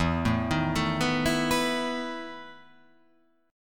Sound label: E Major 7th Suspended 4th